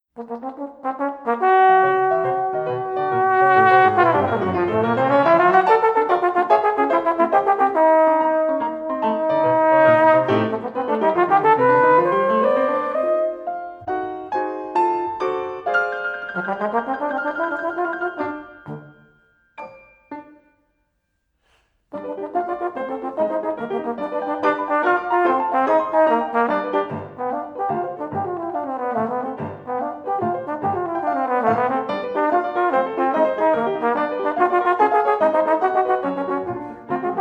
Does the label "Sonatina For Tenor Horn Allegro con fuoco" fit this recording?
Yes